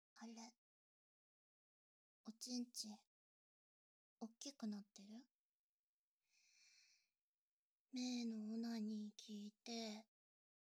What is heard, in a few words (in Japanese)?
貴方にフェラ カウントダウン無し